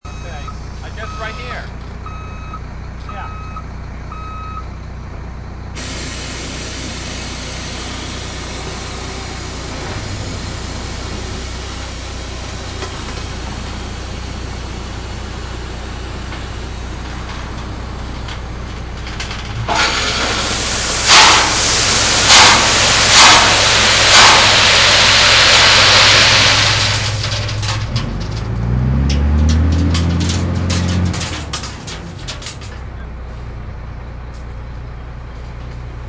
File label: Dump Truck Dumping, High quality audio of a dump truck dumping gravel.; Trucks; Vehicles